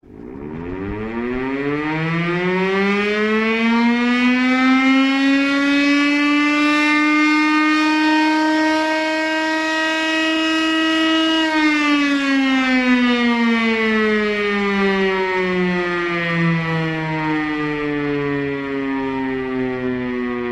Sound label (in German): Sirene Feuerwehr E57 Schule